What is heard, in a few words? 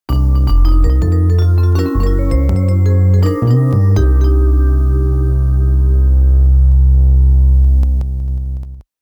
QSound Logo; Street Fighter Alpha 2